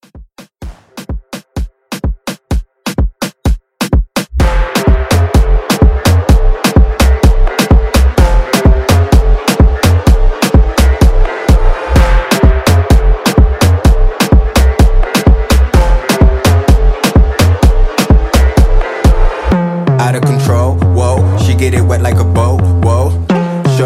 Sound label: Afrobeat 127